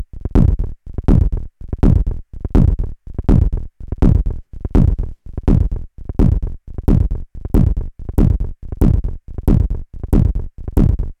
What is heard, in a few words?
doepfer moduler